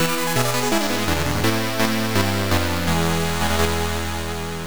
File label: Failed in the Attack; Hit Ratio Result 2; 1943 kai turbografx 16